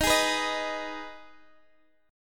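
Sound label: E flat Suspended 4th Sharp 5th, chord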